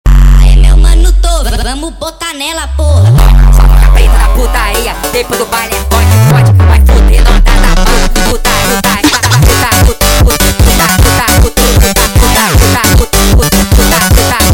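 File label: sped Up, phonk music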